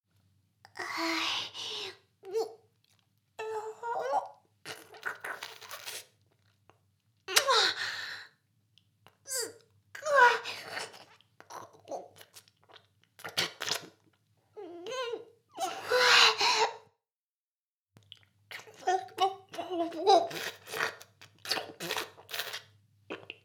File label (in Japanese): フェラ; 全妹計画２; 【メスガキ妹】わからせてくれるのよねぇ～?おにぃ～・ちゃん♪【全妹計画<オール・シスターズ> 2妹め】